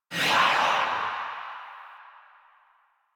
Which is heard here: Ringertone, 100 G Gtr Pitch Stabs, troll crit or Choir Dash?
Choir Dash